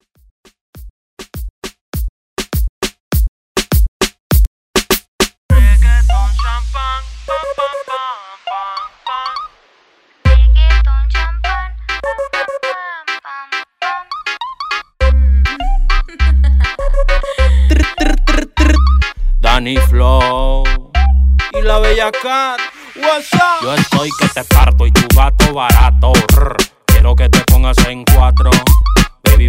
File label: Reggaeton Redrum